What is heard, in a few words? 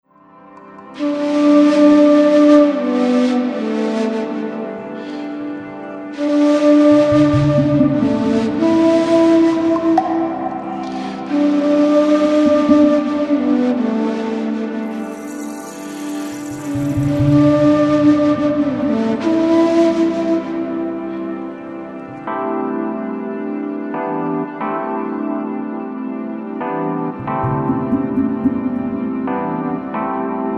Wurly